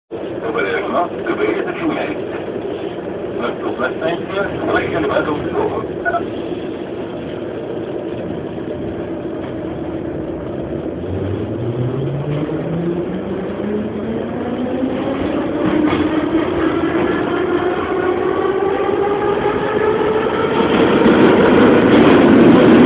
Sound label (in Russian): zvuk metro